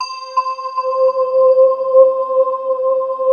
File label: K2000 BEL; East West; Global Sounds